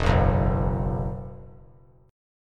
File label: E Suspended 2nd, down; Esus2 strummed